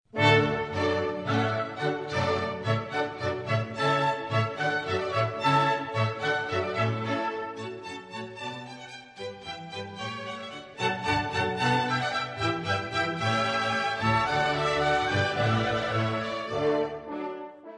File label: Water Music Suite Hornpipe; Handel